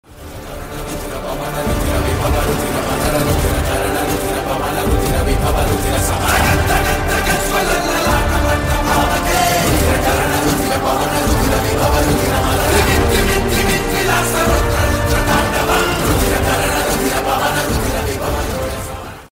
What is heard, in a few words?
Trailer Bgm; Mirai